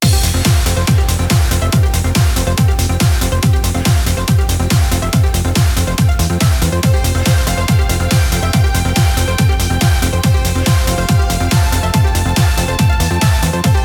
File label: Ad Item; SD Gundam Battle Alliance; ps4; xbox series xs